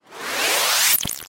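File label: acceleration sound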